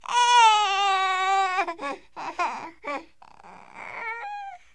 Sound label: baby; svencoop